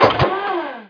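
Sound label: elevator; MOTS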